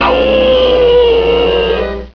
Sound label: pain